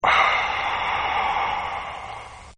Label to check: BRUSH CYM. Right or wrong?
Wrong